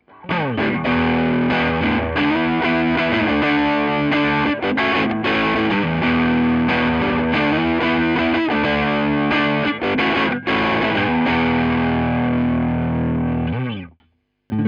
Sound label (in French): tele manche sature; fender road worn